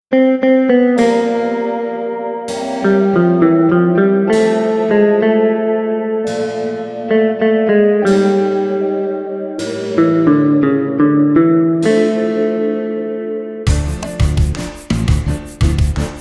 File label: brass minus